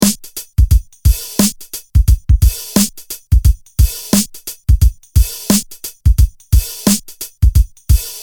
linn lm2; Hiphop pattern

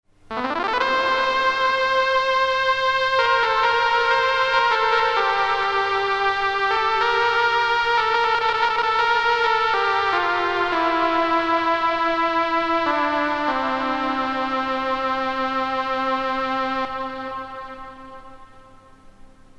e310u leads, EMINENT 310 U; Leads (played by Per Kristian)

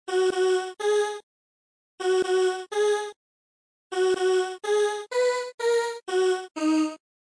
fairlightcmi; Sound demo

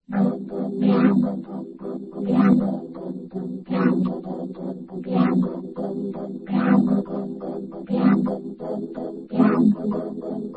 korg vc10; 1978 / Country : / Japan; Sound demo

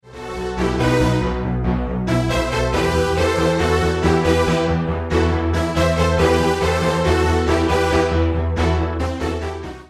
d-50; Kokubostrings

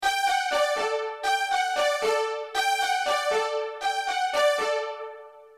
d-50; Kokubostrings

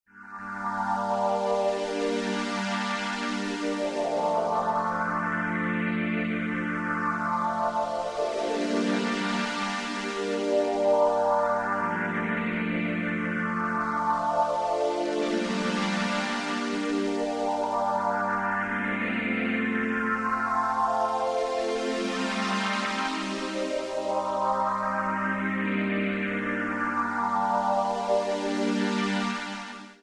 e310u string; Solina through Small Stone; Solina through Small Stone (by Johan Schurer)

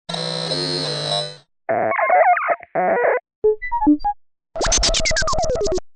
vcs3, EMS Synthi VCS3

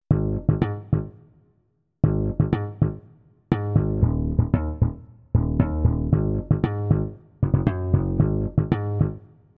emulator funk bass

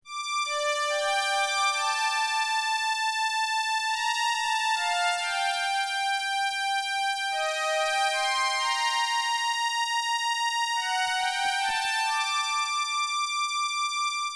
obx lead

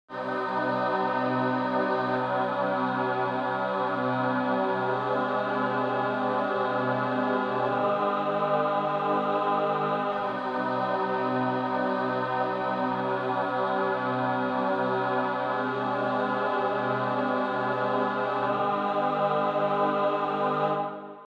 emulator male female choir Large Ambiance; USA

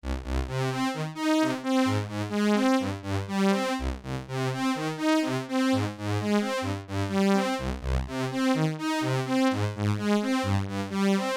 ob-xa, Oberheim OB-Xa

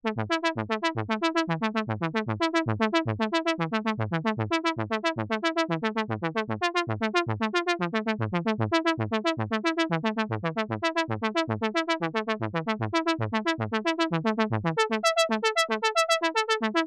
arp 2600 sequence

